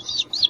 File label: pwikpwik; Quentin C's Gameroom